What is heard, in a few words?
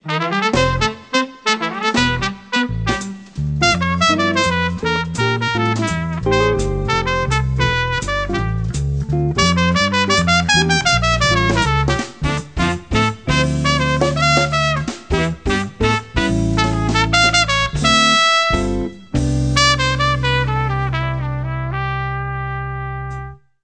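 trumpet jazz; Musical Instruments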